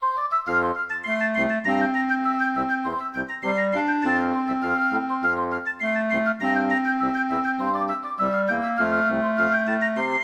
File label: Classical Music; Nancy Drew: Danger by Design